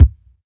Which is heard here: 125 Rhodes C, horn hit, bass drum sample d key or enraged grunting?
bass drum sample d key